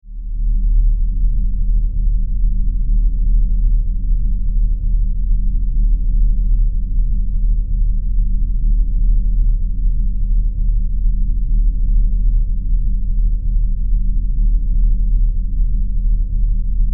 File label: Spaceship Interior, Spaceship, Interior, Rumble, Drone; Deep, Throbbing, Bass; 5.1; BlastWave